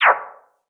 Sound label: Cancel; D4DJ Groovy Mix; Android, iOS, Mobile